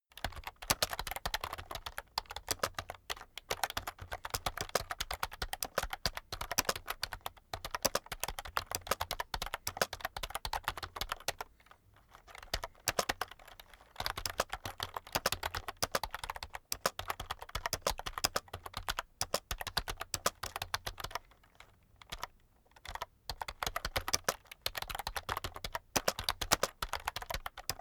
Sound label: keyboard external type sequence heavy, computer